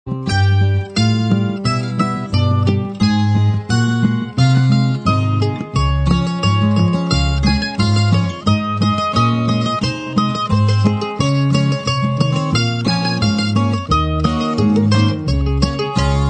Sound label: music; jacob